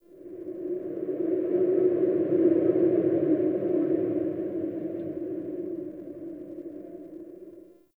Wind Howl; Ambience; Dungeons; Under City

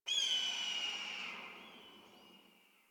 Exterior Distant Calls, Ambience; Warframe; Duviri

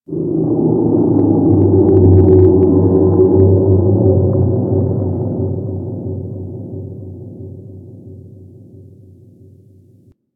Ocean Underwater Creatures Large; Ambience; Warframe; Grineer Ocean

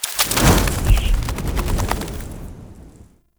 Infested K Drive Boost Fast, Vehicles

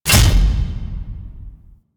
Attack Impacts; Ambience; Golden Maw; Warframe; The War Within

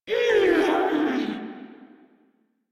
Death Grineer Female, Barks, Battle; Warframe

